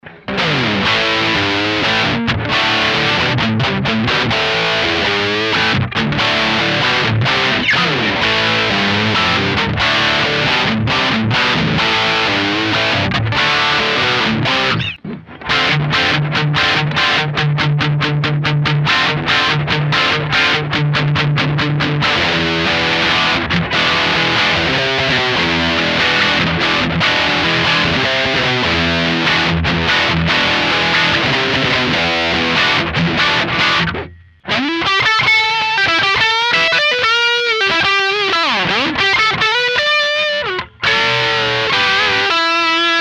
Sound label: bridge dirty; axetec